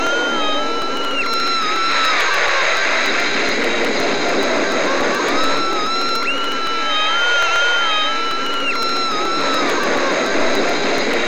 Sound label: More Screaming